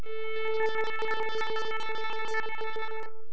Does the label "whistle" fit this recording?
No